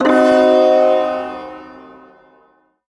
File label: Shawzin Blues Chord; Warframe; Duviri Ersatz Shawzin; Chords